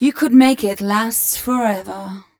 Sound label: f mel a flat, fem Vocals